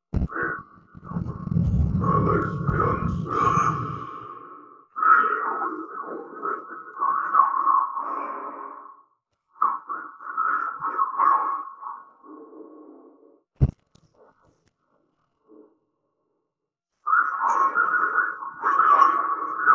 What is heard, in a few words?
drums